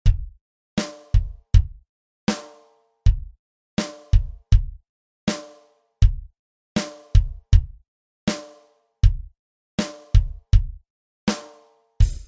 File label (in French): batterie; Rythmique 4; Constantes et modifications dans la musique jazz